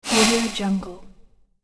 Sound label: magic stun flash